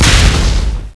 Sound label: Hit wall, zombie; cstrike; Nemesis